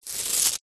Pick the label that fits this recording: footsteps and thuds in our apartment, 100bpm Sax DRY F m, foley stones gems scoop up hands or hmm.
foley stones gems scoop up hands